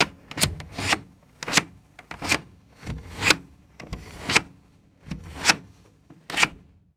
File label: plastic car ventilation switch sliding and clicking; mechanical